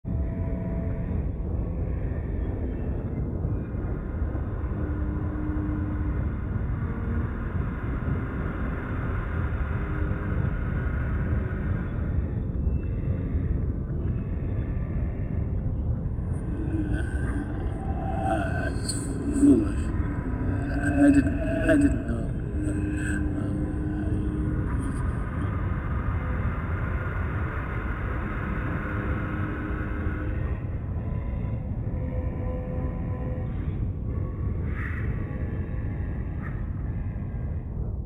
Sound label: Spectral Ambience; Legacy of Kain Defiance